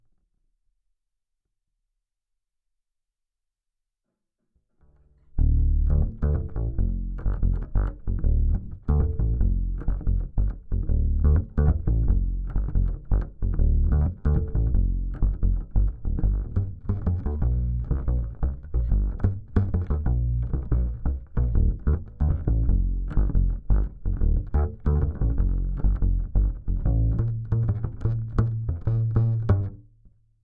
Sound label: Bass DI